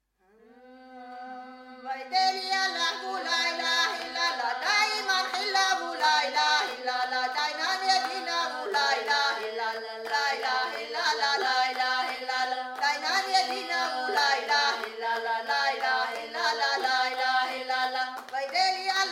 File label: chechens, polyphony